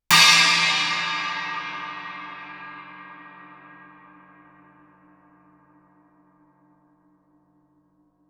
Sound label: chinese stick shoulder ff stereo, Suspended Cymbals; Percussion